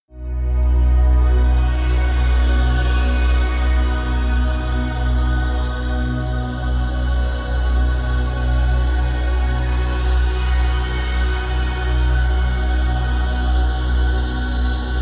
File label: promo pad